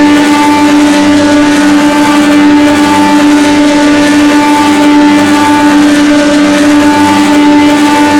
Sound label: EX ON VERY HIGH, F1; AOS F1 2022; FSR2018